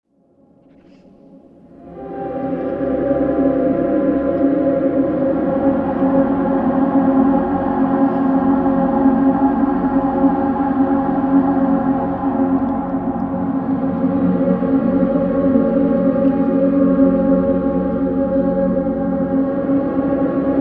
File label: Hallelulah, large choir sings; Singing; Voice; Tom Hutchings